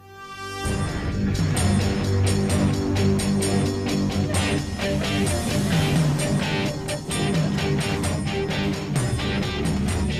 Chasing Music

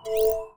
Windows Battery Low

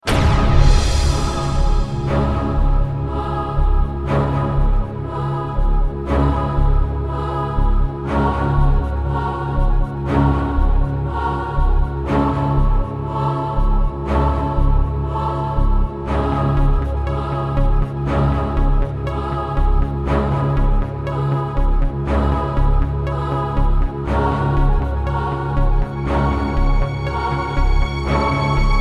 Clock; Millionaire Soundtrack

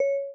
begin; i OS; iOS10

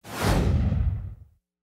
Switch the Question Whoosh; Millionaire Soundtrack; US Soundtrack (1999 - 2008)

